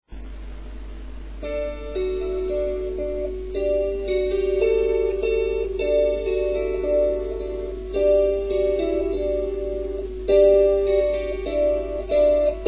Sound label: tuned